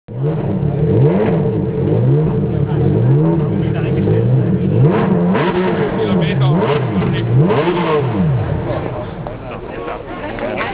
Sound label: Ferrari Enzo Ferrari Sound